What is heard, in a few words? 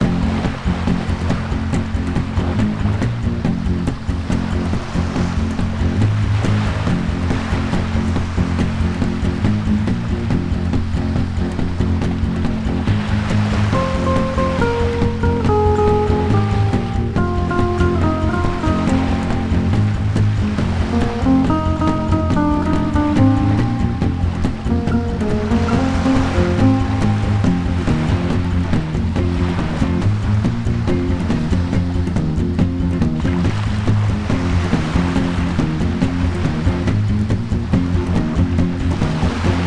with beach ambience; Tintin Destination Adventure; The Eagle's Cross